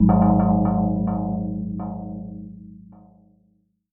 Freaky Chimes

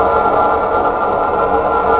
portal loop; savant

